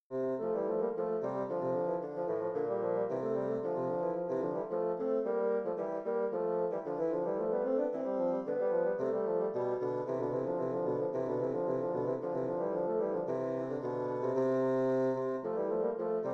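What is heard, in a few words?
allemande bassoon duet; mattheson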